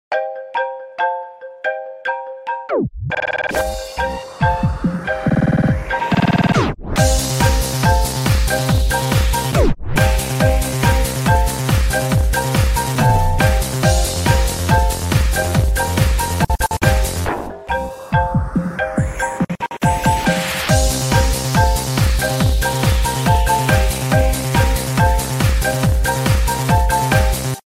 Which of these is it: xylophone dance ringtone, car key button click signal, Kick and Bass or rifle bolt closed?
xylophone dance ringtone